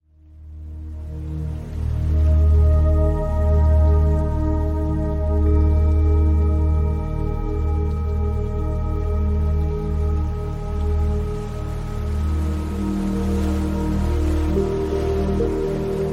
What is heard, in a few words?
Underwater Sunlight